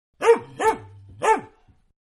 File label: Three Barks, Dog; Dogs; Animals; Manhattan Production Music